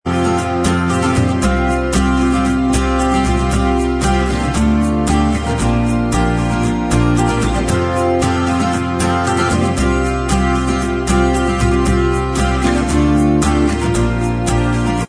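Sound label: acoustic, instrumental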